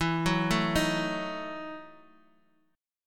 E Major 7th Suspended 2nd Suspended 4th, Bass Standard; EM7sus2sus4 arpeggiated